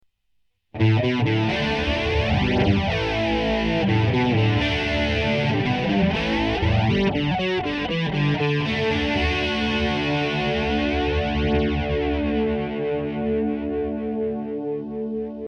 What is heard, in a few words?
disto chorus, guitare